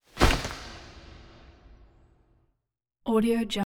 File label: cloth slick whooshes wet